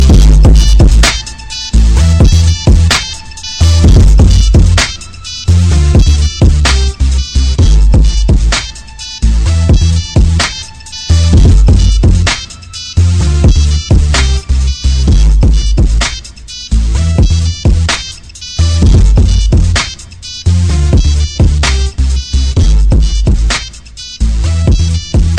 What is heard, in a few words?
bass tone; blues; nokia